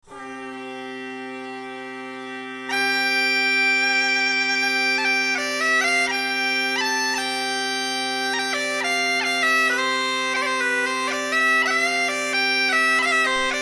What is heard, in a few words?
gaita